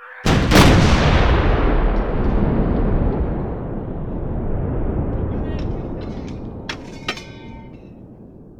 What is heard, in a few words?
Cannon, Voice Command And Fire; gun and weapon sounds; captain audio; fully loaded